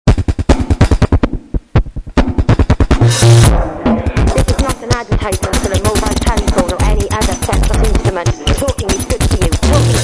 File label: moog